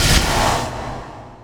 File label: familiar port in; visage